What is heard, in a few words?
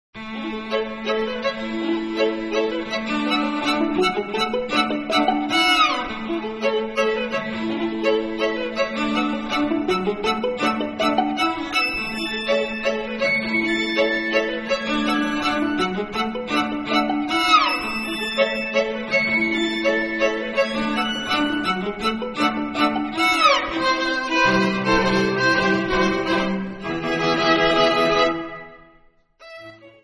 stringquartet